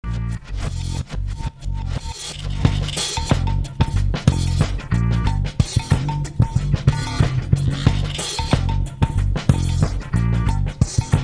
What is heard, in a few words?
Rock Steady